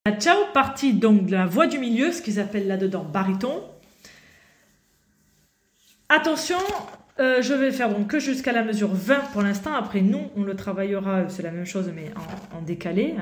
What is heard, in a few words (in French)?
partie baryton; Bella ciao